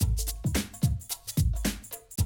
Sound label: Classic DrumLoops, 110